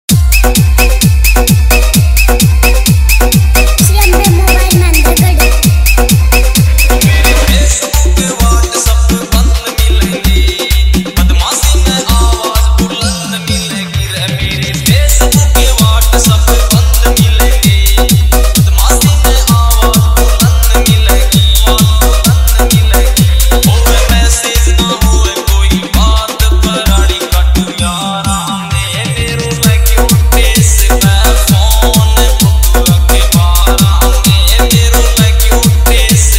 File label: Hard Bass Full Vibration Mix